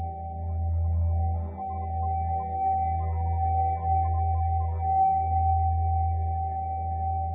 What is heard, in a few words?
drone spacey